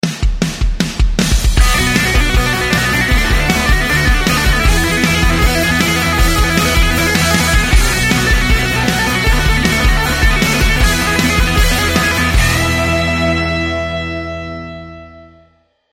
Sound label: sting eat; disneyxd; stuntastic